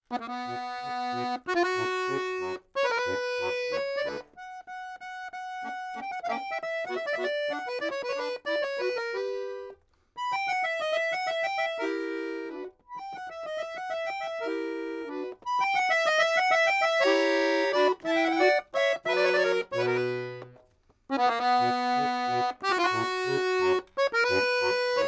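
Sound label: domra, Neumann TLM170; bayan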